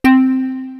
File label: WAVE HRP, KORG; WS KORG; East West; Global Sounds